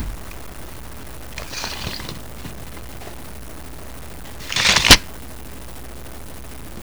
TAPE MEAS

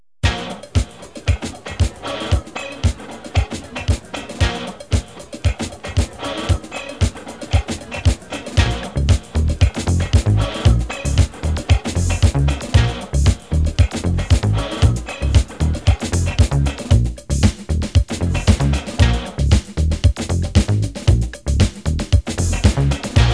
SLAP RAP

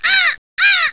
BIRD

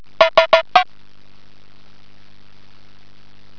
DINGDON

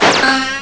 ping nois; effects; drawer2

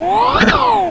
WHIZZER; HIGHTECH

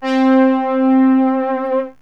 JUPITER, SYNTH

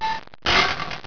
gate

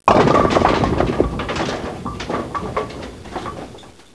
bowl

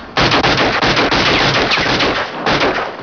GUN

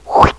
SUCTION

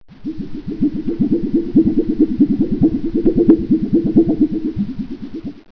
FLUTTER, WEIRD

